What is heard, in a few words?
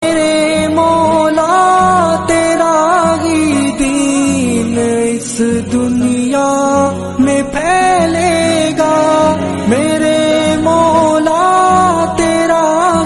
islamic